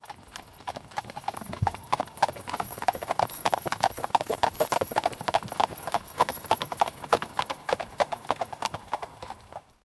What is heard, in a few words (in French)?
cheval au galop dans la